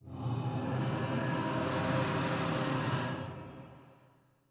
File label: Cpatain Ghost